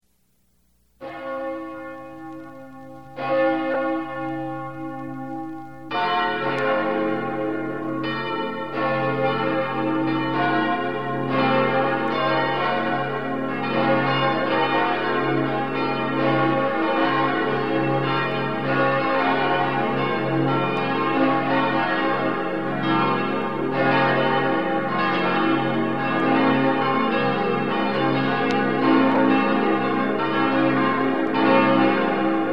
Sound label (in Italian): Campane